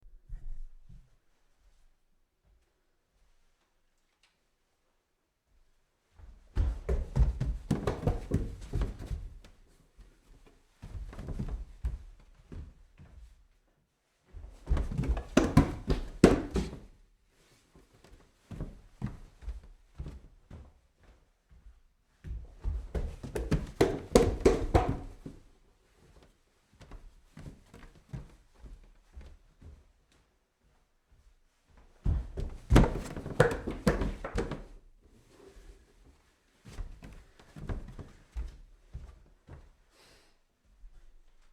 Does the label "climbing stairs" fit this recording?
Yes